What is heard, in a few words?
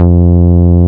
Root F, Bass